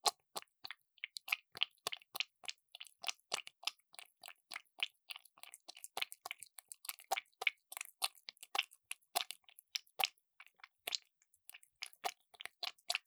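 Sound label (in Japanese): クチュ音（ノーマル～高速）; ASMR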